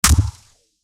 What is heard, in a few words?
skeleton arrow; minecraft